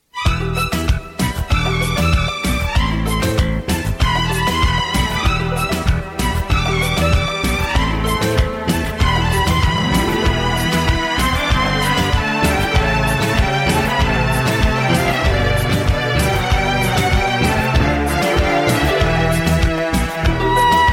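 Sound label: Violin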